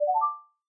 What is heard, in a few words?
match